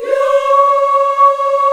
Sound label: AFROYA C#5, AF.VOWELS; Spectrasonics; Heart of Africa 1